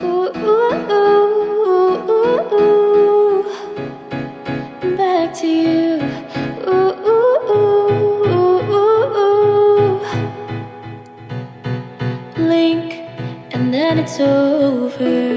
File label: disco music